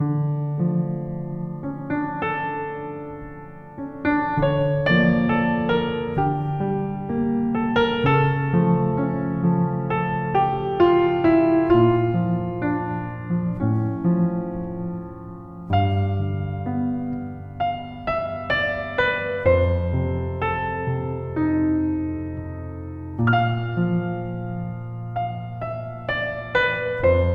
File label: Sad Music; super meat boy 2015 ps vita